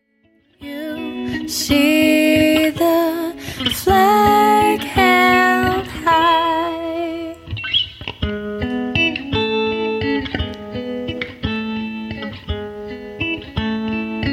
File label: Tidal Waves